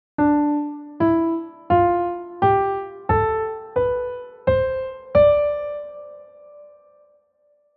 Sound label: D Major bad